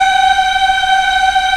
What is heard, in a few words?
African Mm f; Roland LCDP11 Africa VOL-1